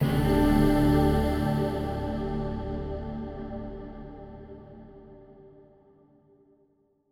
Stingers sting gain; Hand of Fate 2

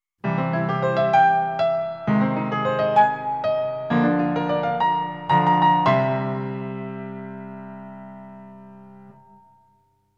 Course Clear Fanfare; Tanoshii Beyer Heiyou Super Mario World complete music collection 1993

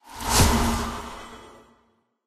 Frosty Throw; Hero Eiskalt

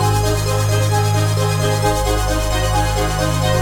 the cave music lilypad; fujii ps4 windows gamerip 2019

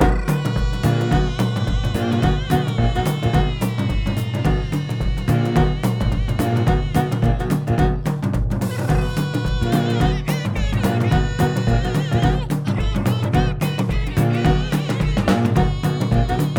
jazzfluenza C

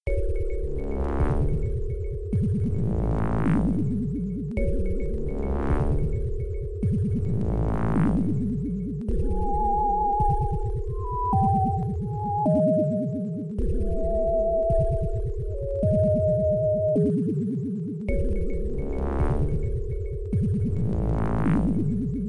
Adlib; Spookville 02; Lollypop; MS-DOS

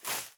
grass cut

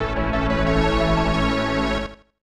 Skill Item; lufia 2; snes

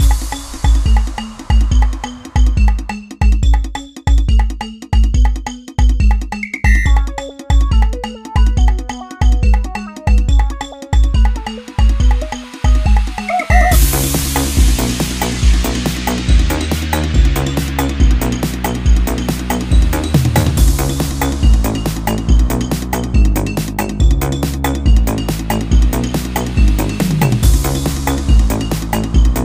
Miniscule (Instrumental); Friday Night Funkin' Vs. Kirby Dave

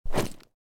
Equip

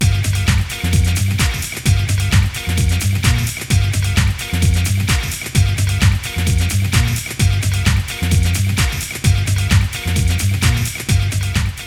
Break Beats; Groove On Fight; Saturn

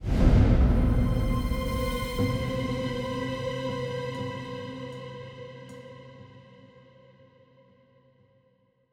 Stingers sting risk

